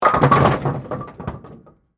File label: Sports, Bowling, Strike, Pins, Knock, Over, Close, Perspective